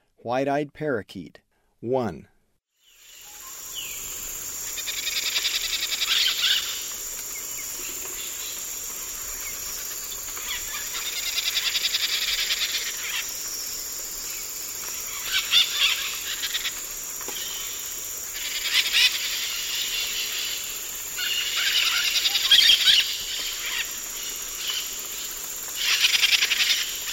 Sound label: White-eyed Parakeet; S. America; Eastern Lowlands